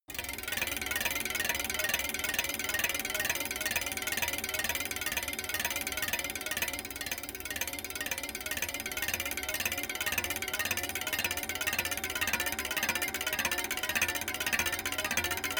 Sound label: bicycle spin wheel spoke rattle; fahrrad; verkehr transport